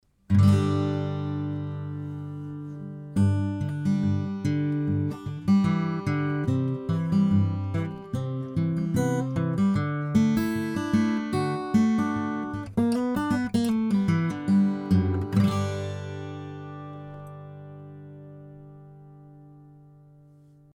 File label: Eastman E10 OM